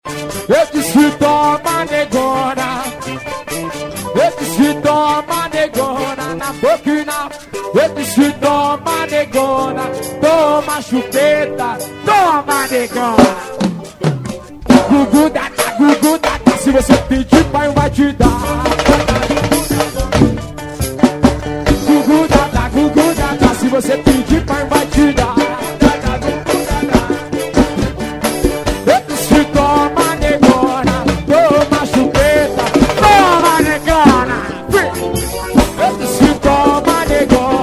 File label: bass